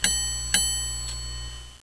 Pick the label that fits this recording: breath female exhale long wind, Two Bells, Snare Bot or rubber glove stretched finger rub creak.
Two Bells